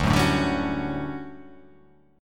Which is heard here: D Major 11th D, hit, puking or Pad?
D Major 11th D